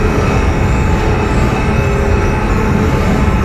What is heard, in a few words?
Machine Dynamo Loop; Machinery - Generators